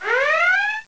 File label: red alert